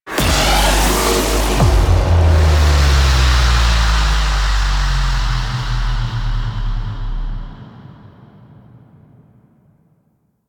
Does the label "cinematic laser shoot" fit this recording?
Yes